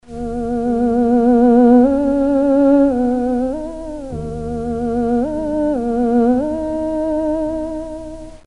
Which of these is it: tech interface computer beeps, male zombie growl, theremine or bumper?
theremine